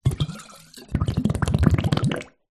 household water submerge object in bathtub bubbles small room reverb